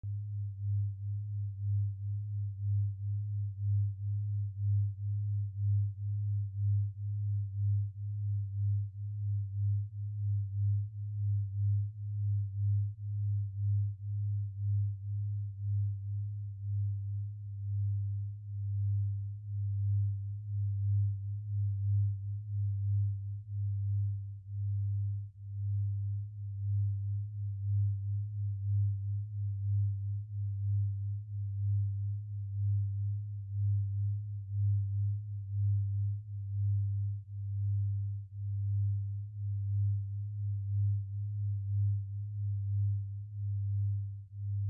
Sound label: 4 Hz Theta binaural beats